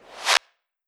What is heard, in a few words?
hand clap reverse long